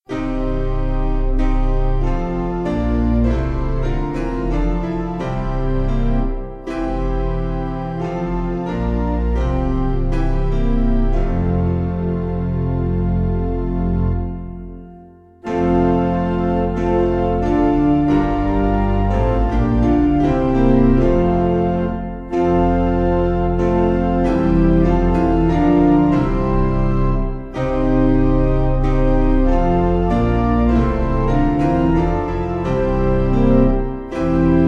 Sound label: Piano